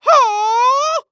Human Male Karate Yell Moan Yell

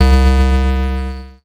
Beep Long; Adobe; Multimedia Internet CD-Rom Flash

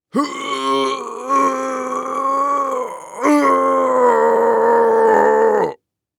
Human Male Strain Pushing Grunt

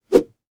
Weapon Sword Whips; AdobeCC

